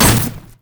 rumble carpet bomb

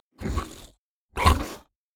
wolf bored; LoL; monsters

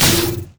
rapid fire; rocket tristana

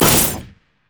hallucinate; jester

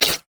voidling effort; LoL; alzahar

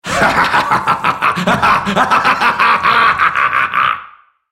laugh; Skarner; en US

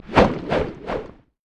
explosive cask; LoL; gragas

